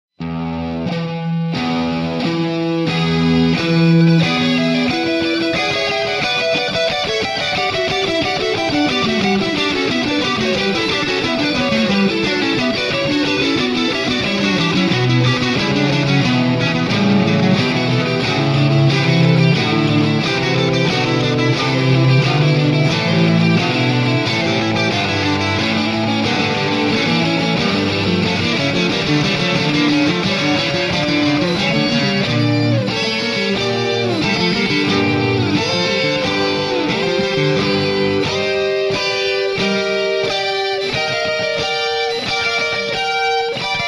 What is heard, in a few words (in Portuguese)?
guitarra; canone para guitarra